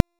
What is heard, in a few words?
Proto Infested Mutalist Big Land Scream; Warframe; Abilities